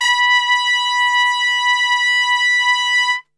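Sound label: B 3 piccolo trumpet, Brass; Best Service; Brass Super Section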